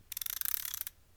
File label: Craftsman Ratchet One Crank